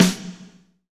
SD Real, Roland; Sound & Vision - Gigapack I

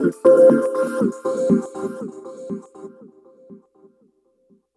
Trance Pulse, Arpeggios & Sequences; Dr. Fink's Funk Factory

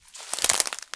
Crunchy Step, outdoor, Footsteps

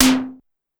Simmons, Clavia DDrum AT, Pitched Up

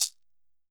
Roland MV8800 percussion; MVPERCUSSION

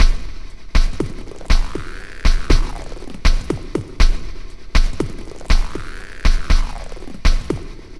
Glitched, Processed Percussion

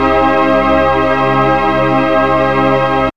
CHOIR; X-Static Goldmine 1

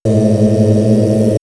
Monks, vox; Bardo Thödol; X-Static Goldmine; vox mix

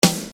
Snare Dr

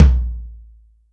Acoustic Tom, Dry Flr, Yamaha DTXpress

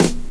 SD, Dance Mega Drums; Best Service; SD-HIP

